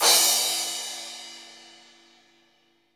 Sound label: CRASH, CYMBAL; drum